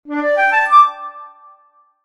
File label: success